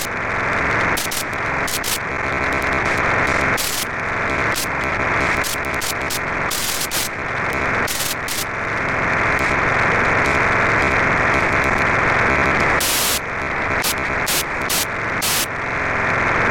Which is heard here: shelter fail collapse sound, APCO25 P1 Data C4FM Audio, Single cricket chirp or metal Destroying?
APCO25 P1 Data C4FM Audio